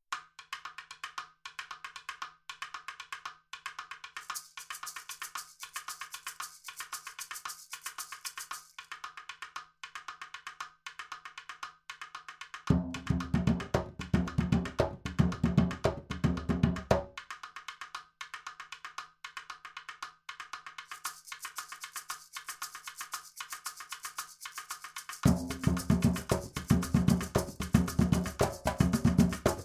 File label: Bomba Sica Rhythms